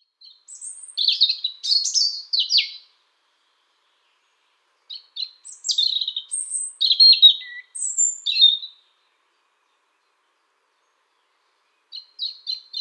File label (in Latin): Erithacus rubecula